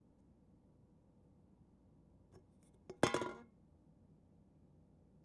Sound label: Empty soda can falling